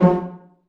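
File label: pizzicato C1 MF, STRING PIZZ; Sound On Sound - Peter Siedleczek's Orchestra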